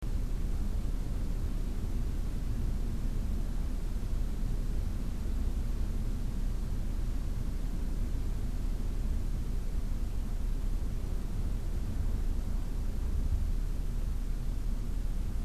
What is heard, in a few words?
atmos quiet room; MILJÖER; ATMOSFÄRER - DIVERSE